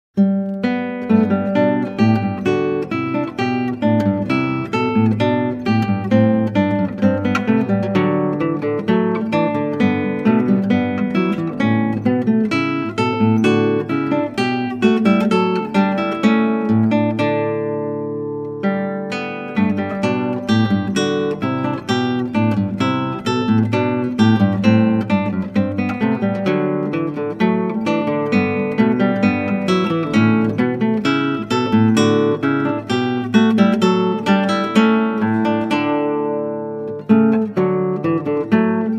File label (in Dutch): Klassieke Gitaar; Bach 50 Solos